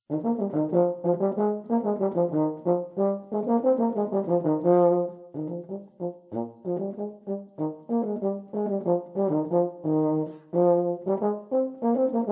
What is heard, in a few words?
Euphonium Etude; Etude 2